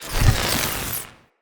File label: Galvanic Zapper Cool Down